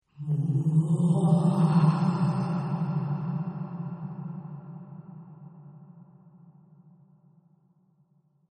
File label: Ghostly Moan; Ghost Sounds; Horror; Partners In Rhyme